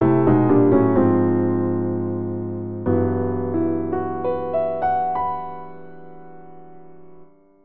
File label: Piano; The Legend of Zelda Ocarina of Time